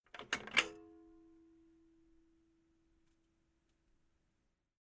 Household Old CRT Television Switched On Via Button